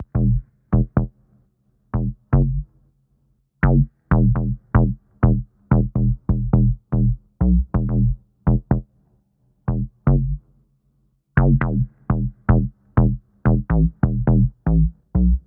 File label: 124 E Bass Synth; Shaft Of Light